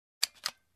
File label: Reloading pistol